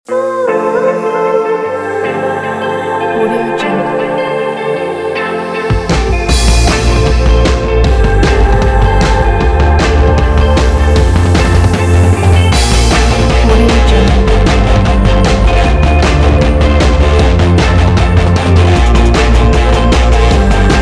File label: indie pop rock